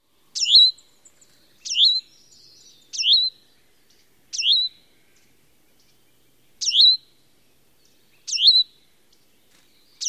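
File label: song, bird; AZ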